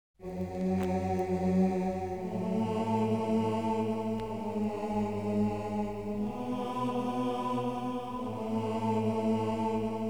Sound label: Chants